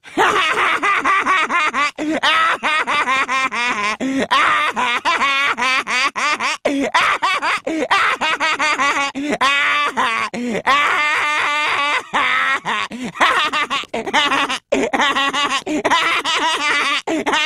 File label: laugh long; chris griffin